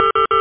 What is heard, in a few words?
Radar Warning; Radar Warning2; Missions of the Reliant!